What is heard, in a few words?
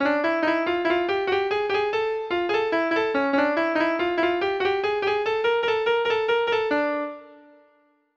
ringtone speeding